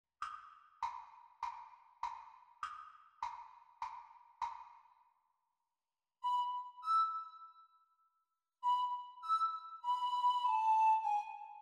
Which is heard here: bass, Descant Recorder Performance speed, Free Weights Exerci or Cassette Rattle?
Descant Recorder Performance speed